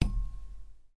click short confirm; Performance; AlexGreeningham

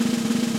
soft slider slide; Crimson Stella

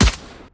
taiko soft hit whistle; Clicking Head

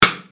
soft hitwhistle; dtvv

